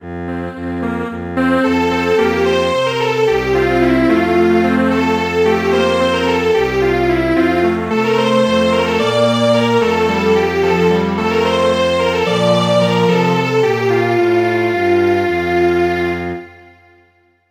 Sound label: Strings Quartet, traditional; The Riera Heir